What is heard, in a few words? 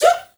FEM GRP, FEM NOISE; Heart of Africa; Spectrasonics; Heart of Africa 1